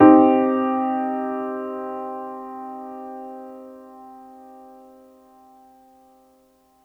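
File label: Piano Upright minor soft; TrashLibrary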